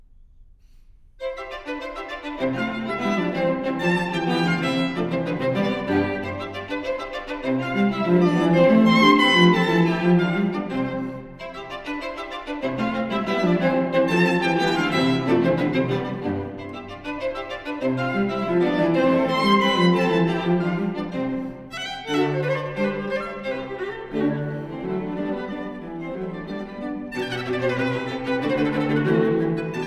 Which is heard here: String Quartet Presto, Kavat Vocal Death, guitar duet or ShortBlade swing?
String Quartet Presto